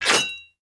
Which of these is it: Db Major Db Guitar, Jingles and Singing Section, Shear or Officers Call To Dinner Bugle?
Shear